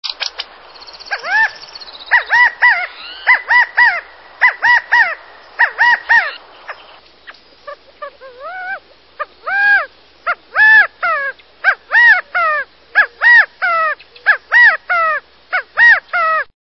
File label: california quail; calls; Audubon